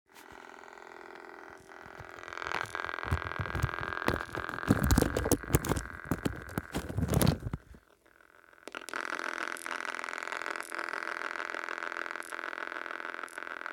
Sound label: noise floor